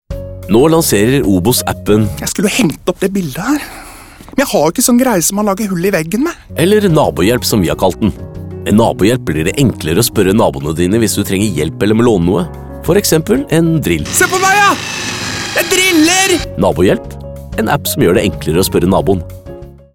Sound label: drill; OBOS